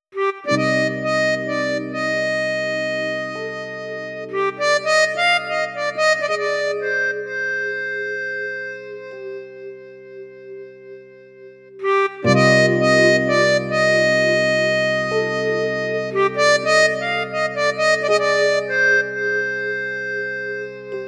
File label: Harmonica; VIVO S10